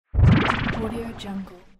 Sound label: power up bonus